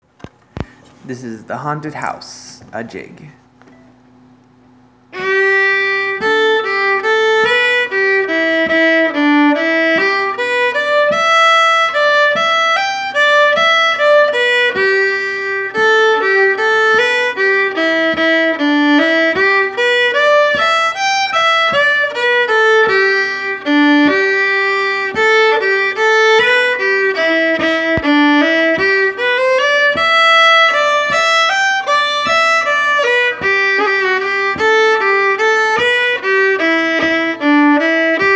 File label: Fiddle